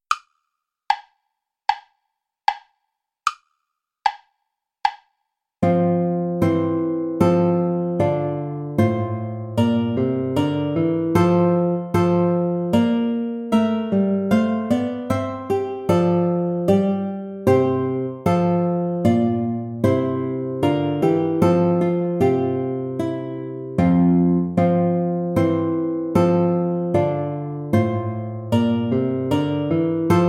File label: play along without guitar